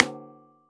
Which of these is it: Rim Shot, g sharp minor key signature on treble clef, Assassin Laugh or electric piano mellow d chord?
Rim Shot